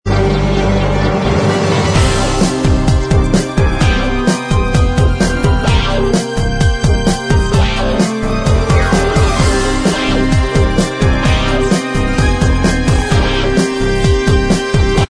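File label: instrumental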